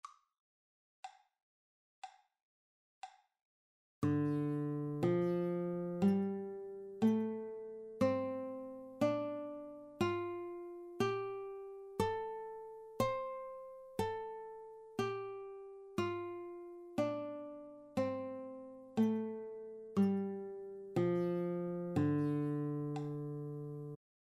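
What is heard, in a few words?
D Pentatonic fretted, guitar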